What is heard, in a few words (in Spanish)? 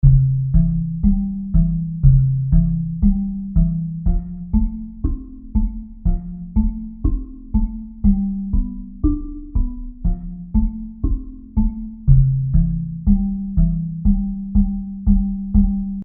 Bajo